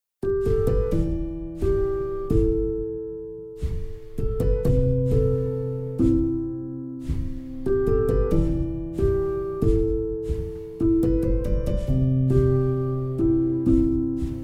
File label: piano